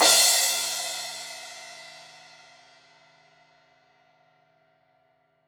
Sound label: Crash 16in edge, Cymbals